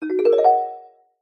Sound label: cartoon ascend mallet orchestral; zapsplat